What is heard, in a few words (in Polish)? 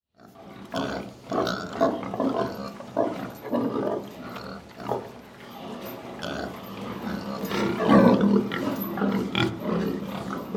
swinia